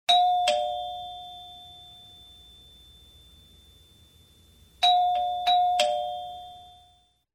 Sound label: Door chimes; SOUND EFFECTS1